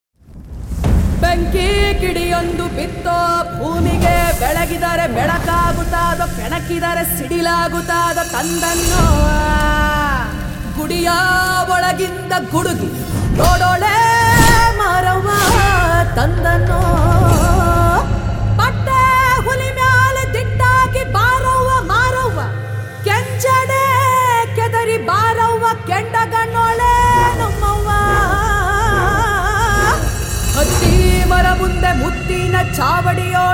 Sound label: Theme Music